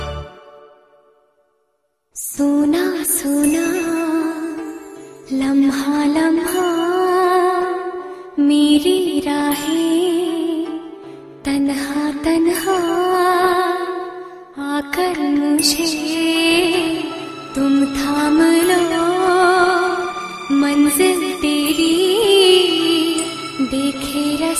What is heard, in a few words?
Sad Female Hindi Ringtone; ringtones